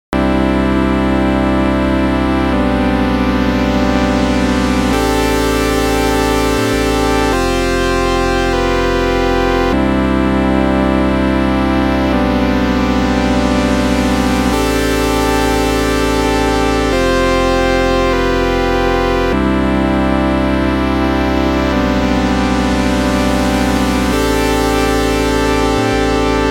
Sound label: PAD SAW